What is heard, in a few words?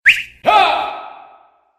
music